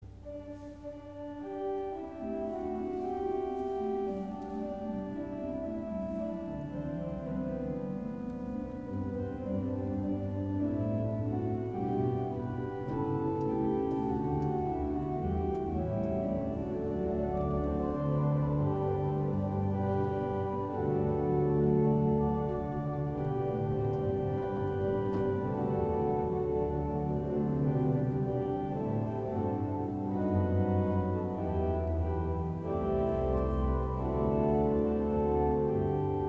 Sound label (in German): clarabella Reihe, orgel